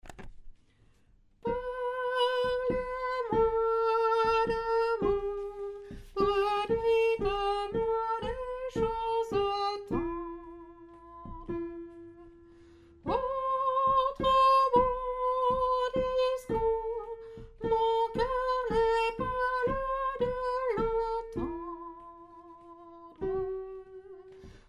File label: soprano, chorale